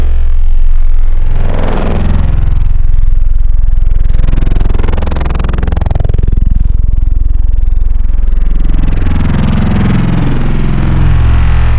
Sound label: wt curses, wavetables digital